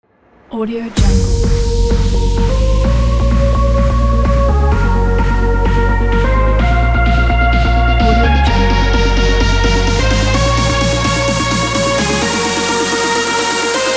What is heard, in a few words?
club